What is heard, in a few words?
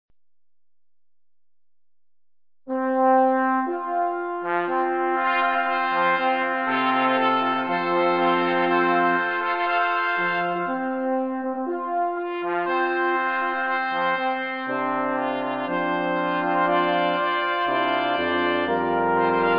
fanfare